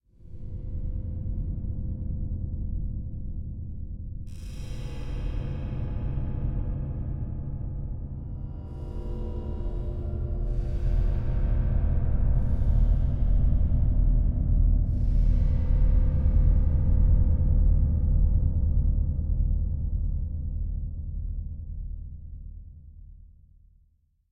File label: Drone Tension And Light Distant Impacts; Hollywood Edge; sound designer's toolkit v2